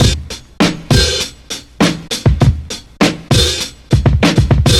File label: Big Beat